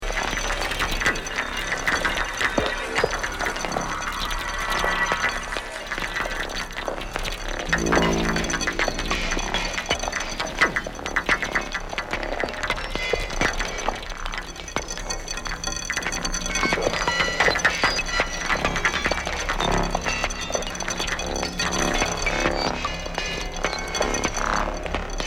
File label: bubbling pops